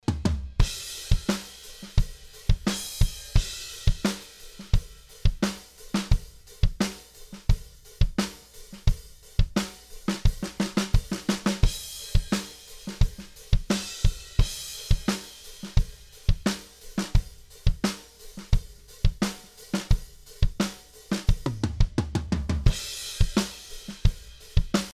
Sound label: reverb, drums; drums-dereverb; clean